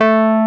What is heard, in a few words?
WURLITZER; E-MU Formula 4000 Series Vol. 3; Analog Odyssey